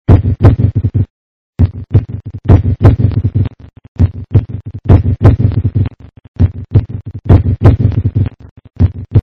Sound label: heartbeat echo reverb; heartbeat2 echo reverb stad; diablo